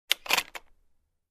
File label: Door Chain lock click sound